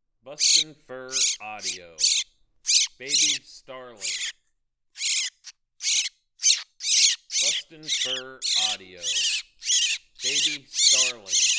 Baby Starling

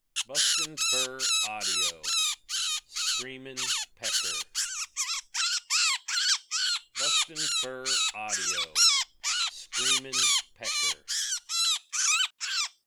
Screamin Pecker

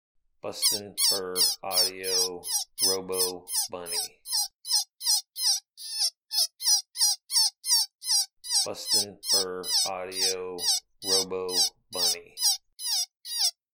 Robo Bunny

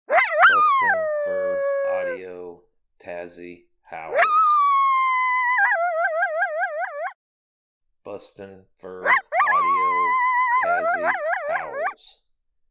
Howls; Tazzy

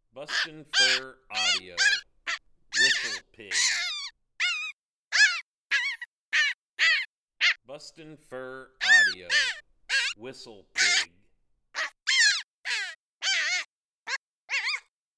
Whistle Pig

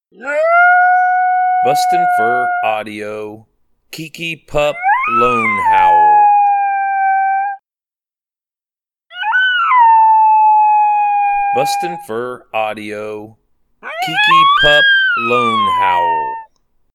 Pup Lone Howl; KiKi

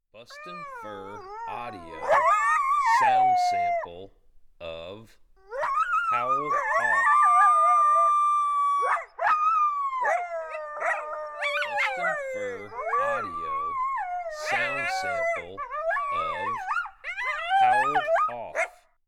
Howl Off

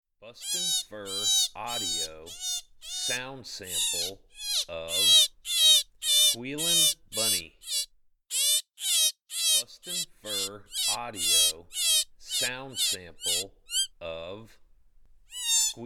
Squealin Bunny

